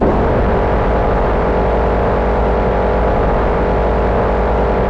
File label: Weapons minigun fire IN; DCS